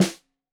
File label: Snare 65 Reg Stereo, Deeply Sampled Drums